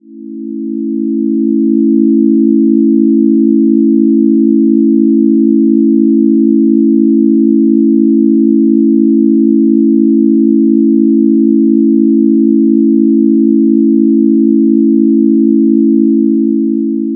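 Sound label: background music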